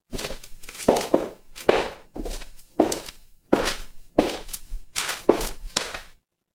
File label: footsteps concrete